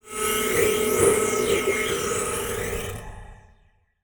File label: Title Transition Power Source; Production Element